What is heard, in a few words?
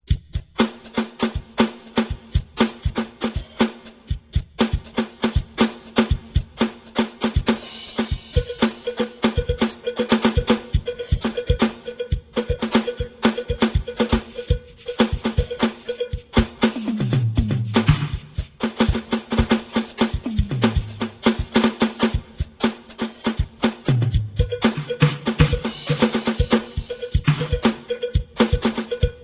rhythm